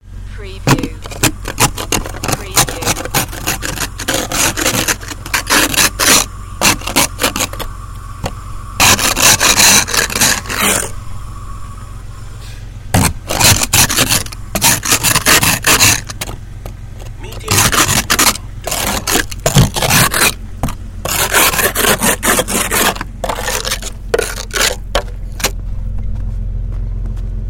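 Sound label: Scraping Ice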